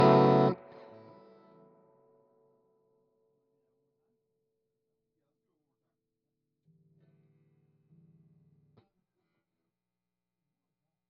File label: GTR